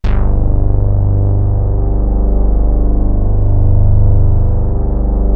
Mighty Moog G1

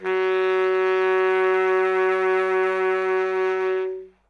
notes f sharp, sax; Dirt-Samples

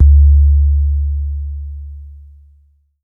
thin 808 sub

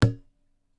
tabla; bahia; Dirt-Samples

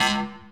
stab; Dirt-Samples

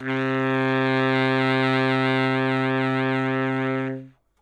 notes c, sax; Dirt-Samples

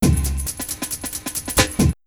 break

